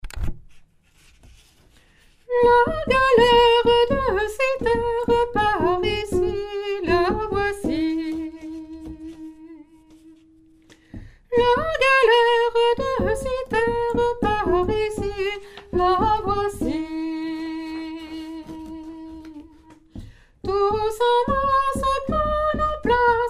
couplet soprano, chorale

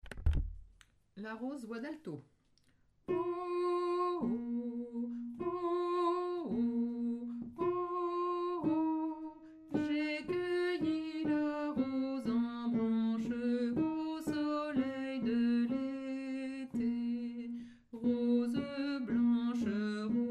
la rose alto

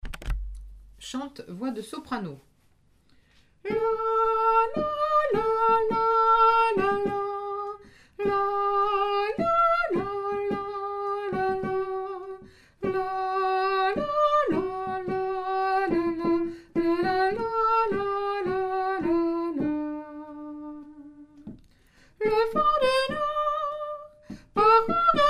chante soprano

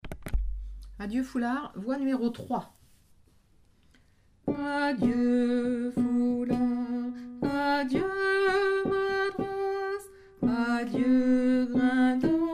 adieu foulard voix 3

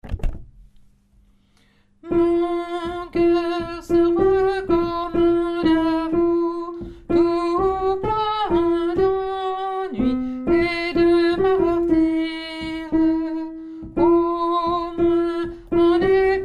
mon coeur alto

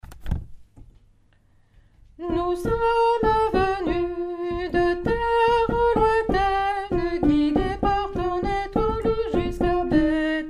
epiphanie soprano; nouveaux noels; chants noel

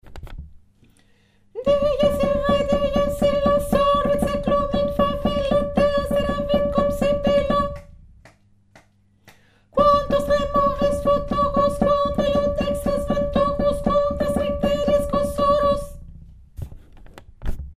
dies irae lettre B haut

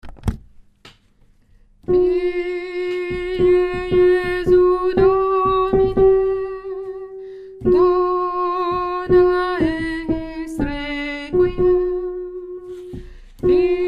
lettre C tenor; chorale

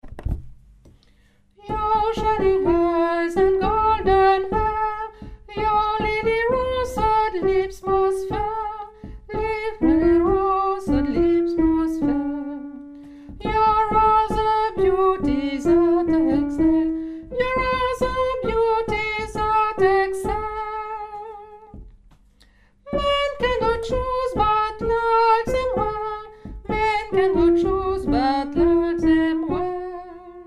soprano, chorale

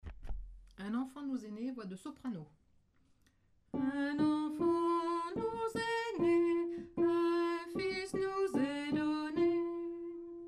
soprano; un enfant nous est ne

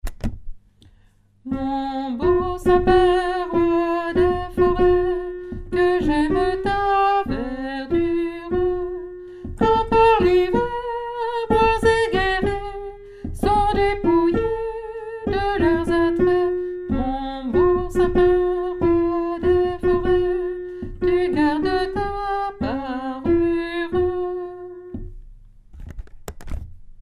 mon beau sapin alto; nouveaux noels; chants noel

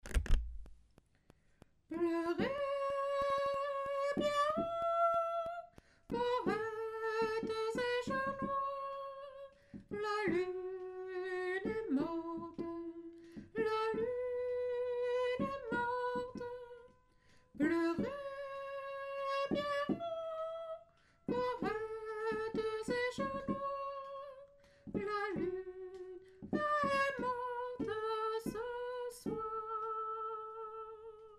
soprano, choeur femmes

